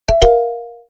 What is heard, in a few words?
Subtle (short), ringtones